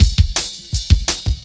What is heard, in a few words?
Jazz Ride Cut, Drum Loops, 166.5; Jungle; Zero-G Jungle Warfare 1, 2 & 3; Jazz Ride B; 90 s Sample CDs